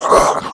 guardian damage

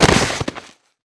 Imp Die

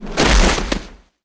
harpy Die

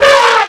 Mastodons Damage; Seer; GamigoNA

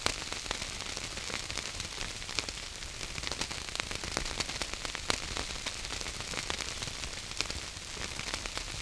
burn; Seer; GamigoNA

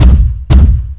Run; Seer; GamigoNA

